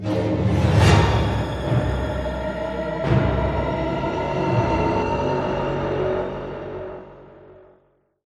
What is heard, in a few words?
Music stinger; Hunted: The Demon's Forge; x360